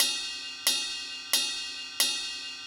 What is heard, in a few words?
Ride Riff, cymbals; Sonic Foundry (Sony Creative Software); Joe Vitale Latin Percussion